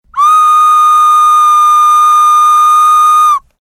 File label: fue